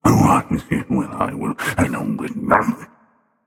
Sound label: grumble; erlangshen